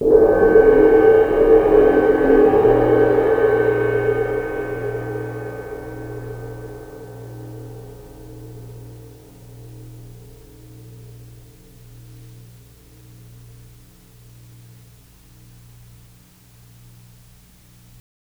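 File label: c suspended cymbal soft roll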